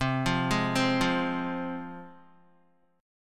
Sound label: C Suspended 4th Sharp 5th, C, down, Guitar; Csus4#5 arpeggiated; JGuitar